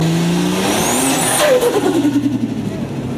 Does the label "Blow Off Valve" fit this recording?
Yes